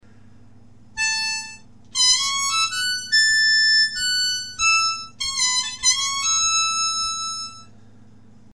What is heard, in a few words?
solo